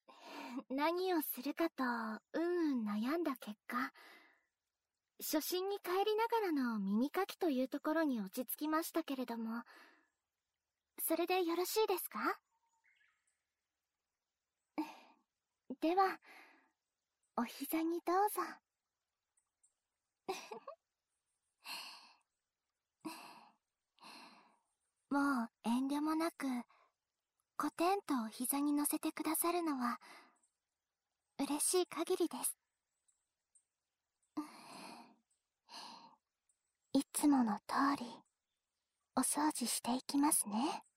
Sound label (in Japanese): 耳かき; 昼; 心緒; キス・耳舐め・情交; うたかたの宿 涼月の菖蒲-落花流水; バイノーラル・癒やし